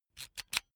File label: electric drill template slide in slow; soundpool